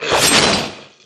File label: player zombie normal female attack; csco; csgo